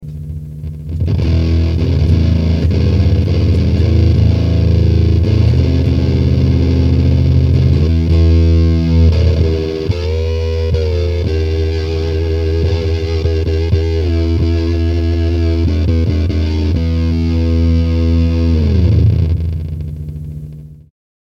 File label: Sq Wave + HF Modl; circuit demos